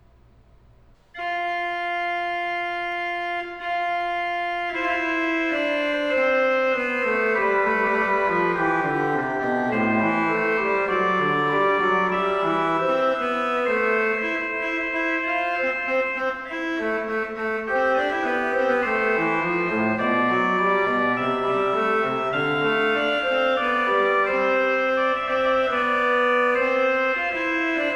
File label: Chorale Prelude, Organ; ''Es spricht der Unweisen Mund wohl''; Johann Pachelbel; Complete Organ Works